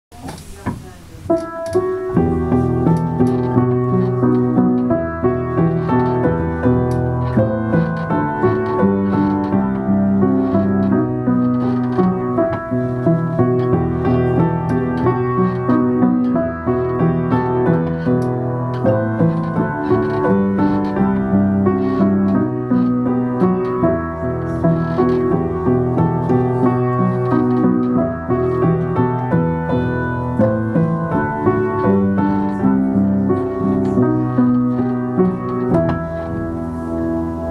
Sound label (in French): piano